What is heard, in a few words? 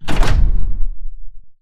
footstep generic; Titanfall 2